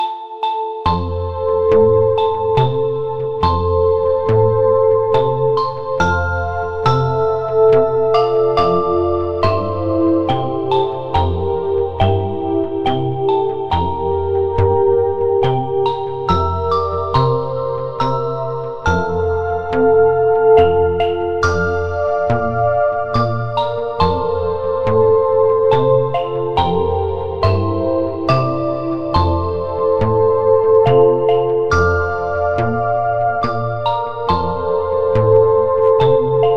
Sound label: brahms lullaby ringtone